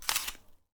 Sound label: Apple Crunch